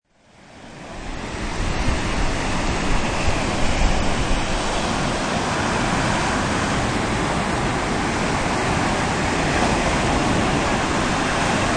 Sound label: Waves Ocean Voices, High quality stereo of waves on beach with distant background noise of huma; High quality stereo of waves on beach with distant background noise of human voices enjoying the day